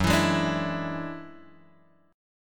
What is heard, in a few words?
F sharp 7th Suspended 2nd Sharp 5th; F#7sus2#5 Chord {2 X 2 1 3 0} strummed